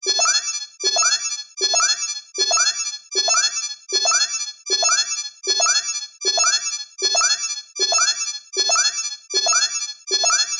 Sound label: science fiction computer console alarm beep warning countdown